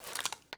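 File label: weapon foley rpd reload lift; Call of Duty Modern Warfare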